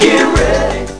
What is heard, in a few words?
NEW GAME